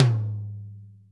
acoustic tom drum g sharp key

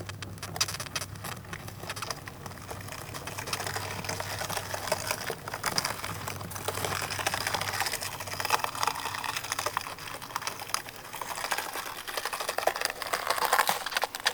velvet red ant

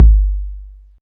subby kick one shot g key

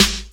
treble heavy snare sample c sharp key; Duration 0:01; Mono; snare drum samples

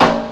2000s nice snare sample a key; snare drum samples

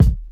classic hot hip hop kick sample d sharp key; kick drum

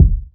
low tone bass drum d key; kick

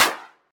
clap sound f sharp key; percussion; single hits one shots

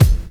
silky kick drum single hit f sharp key

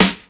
natural steel snare drum g key

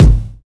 short steel kick drum g sharp key; kick drum samples

